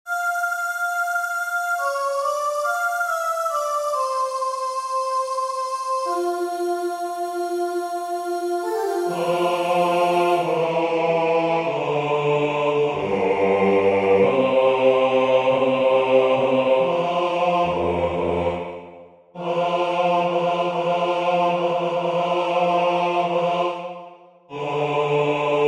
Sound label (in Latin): Laudate dominum Bas; W.A.Mozart